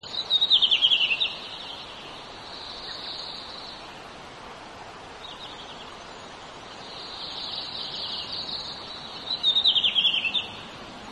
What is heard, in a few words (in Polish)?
modraczek na tle innych ptaków; wroblowe; turdidae